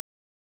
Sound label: impulse